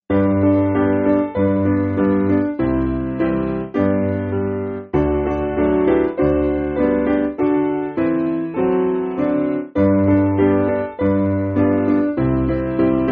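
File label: Piano, Music Backing Files for Churches; Walking In The Comfort; Myland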